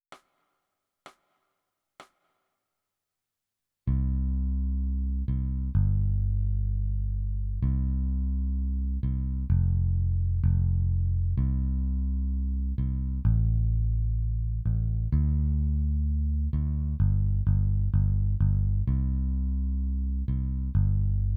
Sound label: Bass